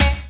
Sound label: stabs